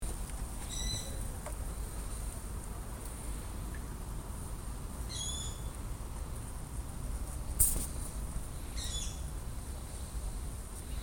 waldohreule

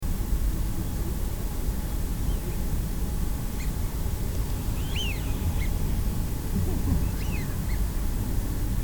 pfeifente; see

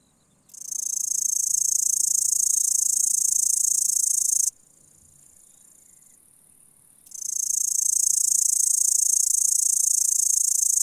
großes heupferd, heuschrecken; Grünes Heupferd

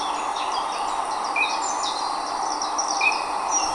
krickente; see

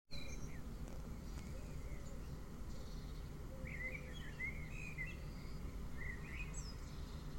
türkentaube; sattnitz

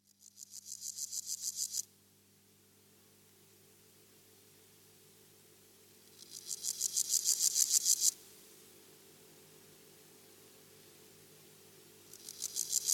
grashüpfer; nachttiere

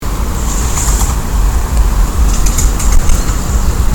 eichkätzchen; Biber Mai 2024

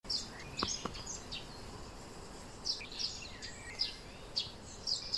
feldsperling; sattnitz

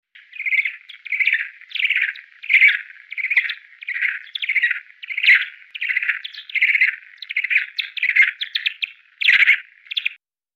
wasserfledermaus, fledermausrufe; fledermäuse rufe

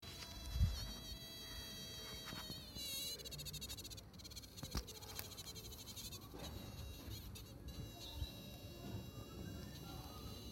narzissen-schwebfliege; was summt; Sally Kendrick

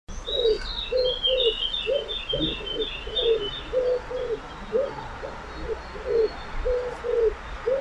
ringeltaube, rufe vögel; voegel

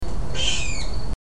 siebenschläfer

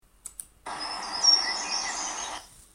gartenbaumläufer; sattnitz

